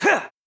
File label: combo, bell; shaman